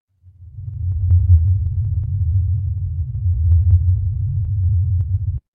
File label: horror heartbeat